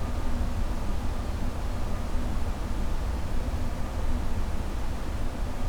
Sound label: city ambient; soundscapes